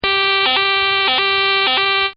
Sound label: Siren; Sirens; Electronics; Partners In Rhyme